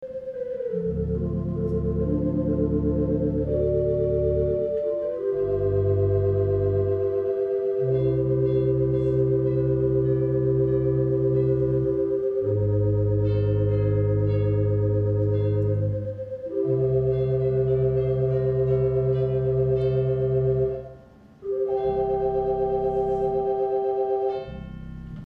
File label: chimes